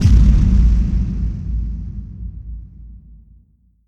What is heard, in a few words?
explo; Apotheorasis